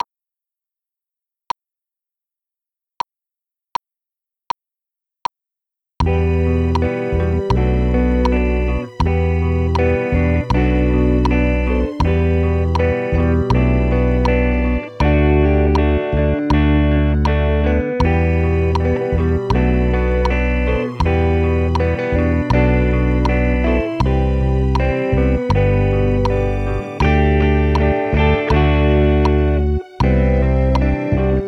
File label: Guitar